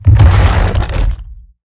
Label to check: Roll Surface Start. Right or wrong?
Right